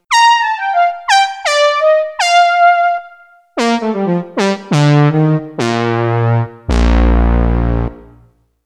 Brassy Synth

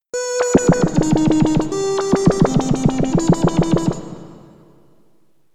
Bubbler

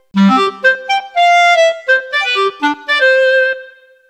Jazz Clarinet

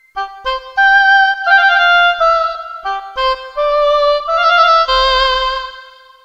Classical Oboe

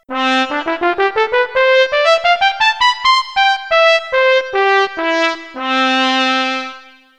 Wide Trumpets

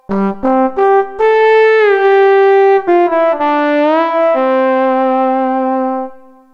Ballad Trombone

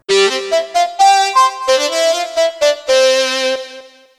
Angry Organ

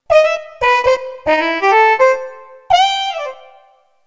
Muted Brass Section

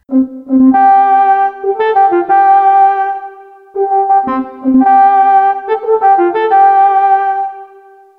Classical French Horn

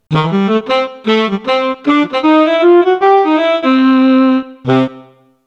Sax Section

